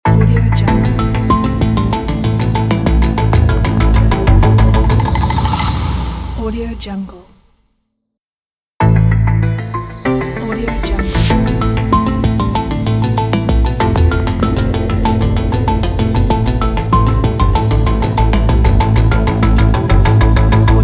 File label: synth logo